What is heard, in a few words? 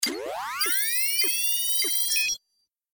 detonator charge up